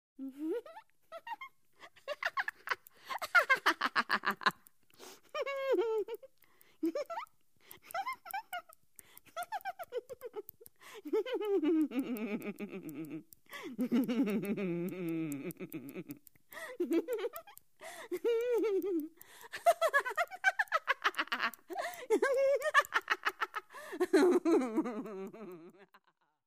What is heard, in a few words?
Woman laughing